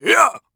warrior attack swing; gamerip

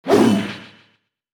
windup

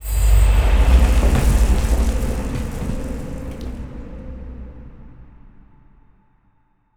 environment temple room earth

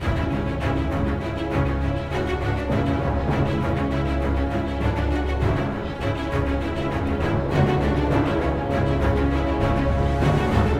Music Shooting Gallery Light Loop; Shooting Gallery 02

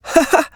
Emote Male Happy; Idle Champions of the Forgotten Realms

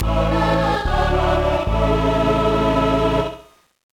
Unknown Jingle; Golden Sun: The Lost Age

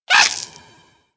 Ice Collision

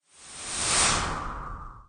player fall; edge extended; android gamerip 2012

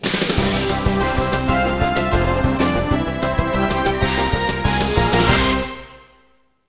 Get Leader Goal Jingle; Speed Racer

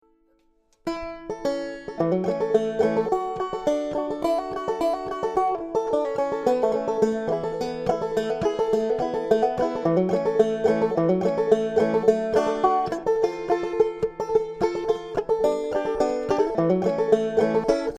Outdoors Old Positive Pos loop banjo

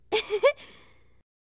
Kiss giggle; Hilzartov; Fairy Size Doesn't Matter

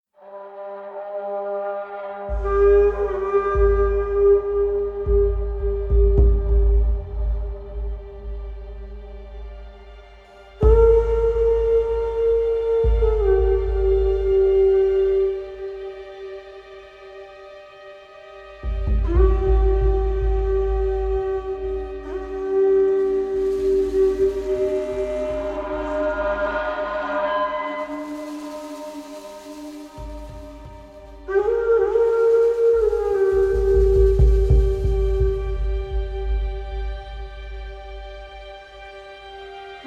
Snowfall; Total War Attila

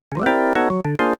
Jingle False

